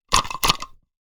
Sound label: Silicone sealant gun single application